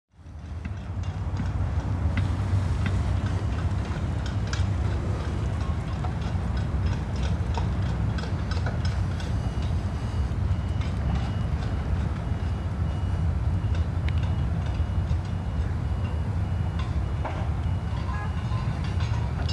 ambiances du risque travail geoclock

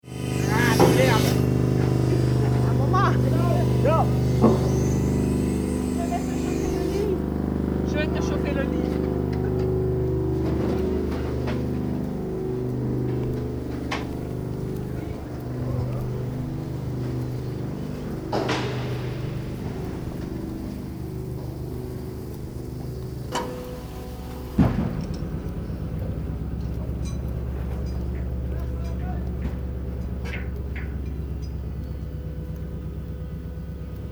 valparaiso a quai; lesconil

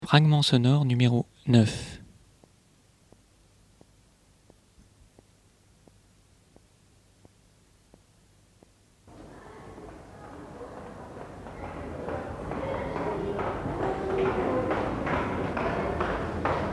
pas jeux; proxemie acoustique habitat

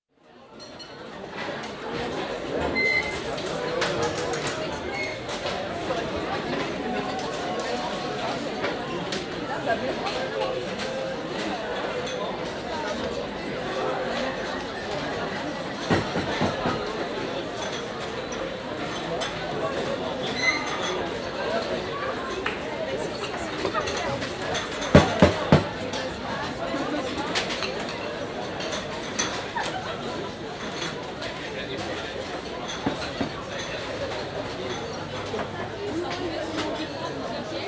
en longeant les rangées de tables; Metabole

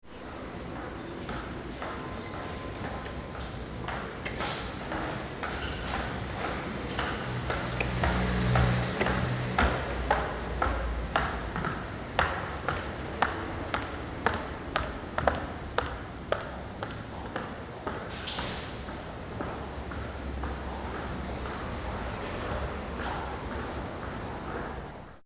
sol urbain martelement talons

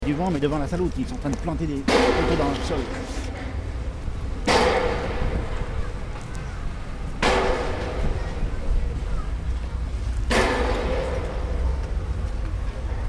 reverberation echo Venezia; plantage

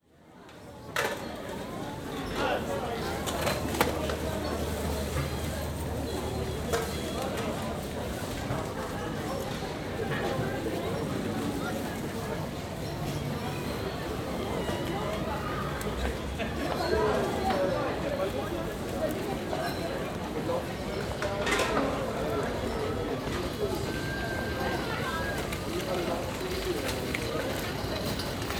En longeant le marche; Metabole

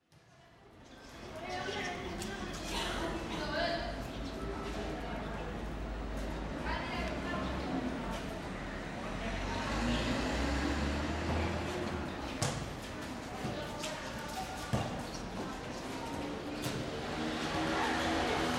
port barcelone marge

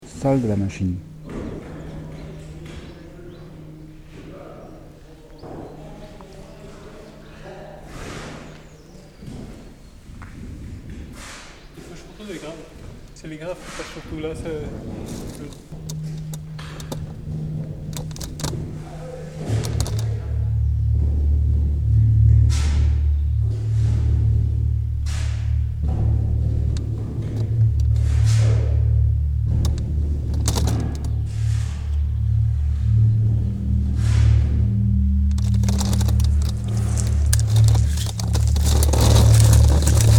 basses carnaux

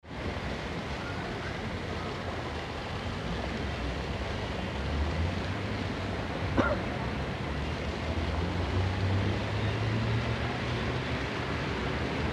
arts de la rue moto

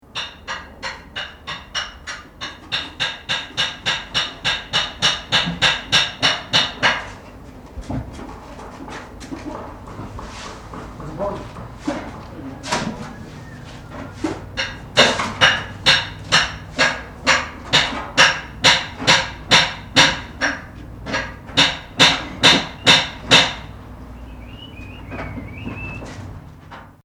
chantier rythmes crescendo; Culture sonore

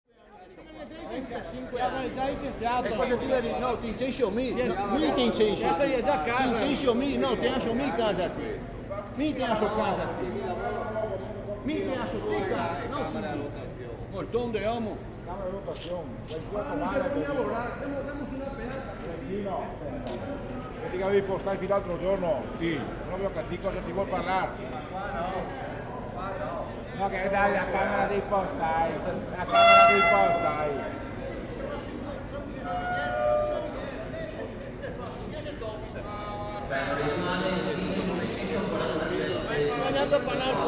portee ferroviaire sons porteurs

